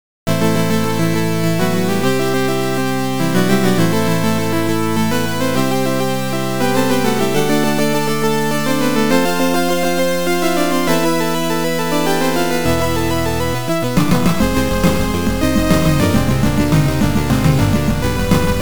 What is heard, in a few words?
Stage 7 BGM; Mechanical Globule